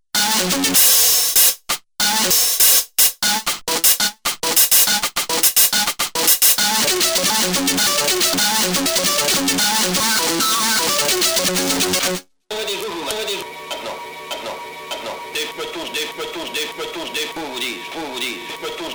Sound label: loops